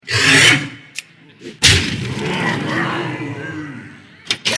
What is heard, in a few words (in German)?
publikum wow no; hearthstone